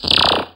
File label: FX Dance